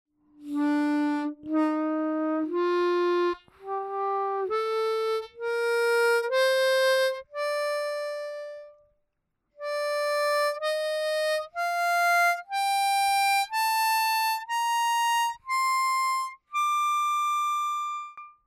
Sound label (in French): mi phrygien